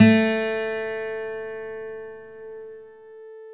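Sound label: GTR A3 AC M; Synclavier Sampler Library; KEYS AND GUITARS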